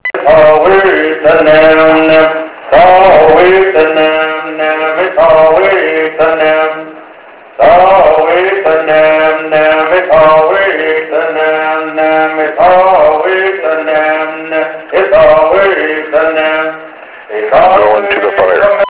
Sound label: Bird